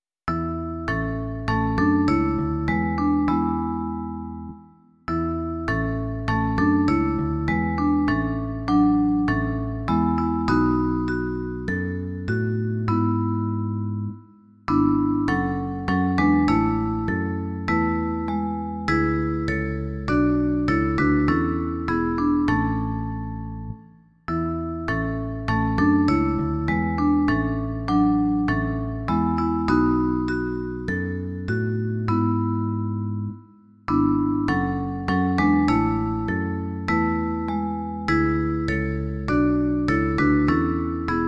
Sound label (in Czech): vibrafon a basová kytara; Svět jsem prošel v jeho kráse; Imaginativní verze